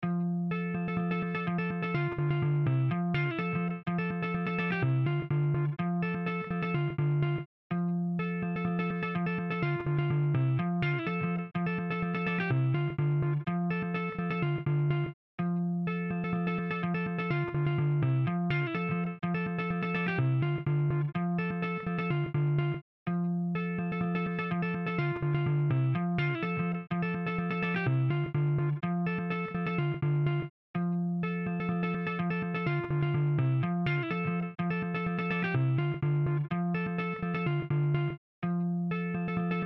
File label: Guitar Rift Loop No Percussion